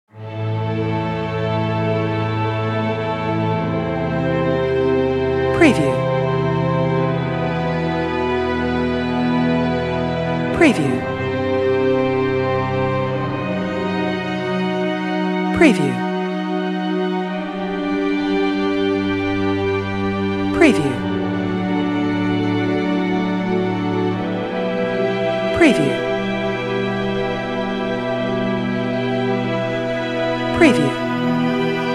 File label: Dramatic Ending - Cinematic End Credits Strings Outro Film Score Soundtrack